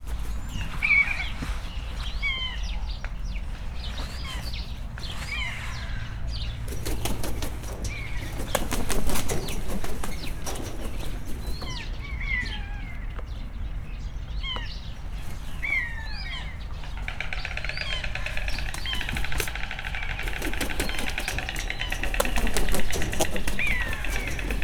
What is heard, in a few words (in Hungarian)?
fekete holló szárnypróbálgatás más madarak is; Hortobágyi Nemzeti Park 2013